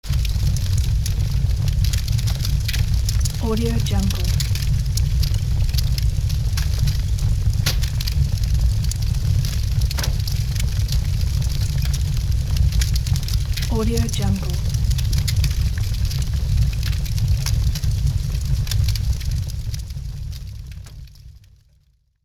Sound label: fire